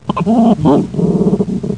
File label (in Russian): zolotoj bambukovij lemur hapalemur aureus